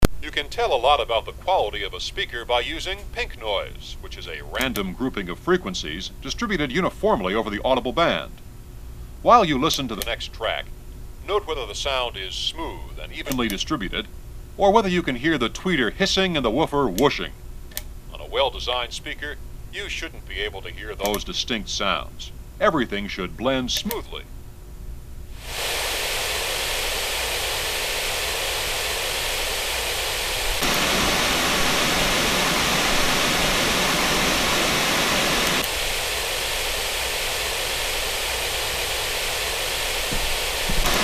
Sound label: voice & pink noise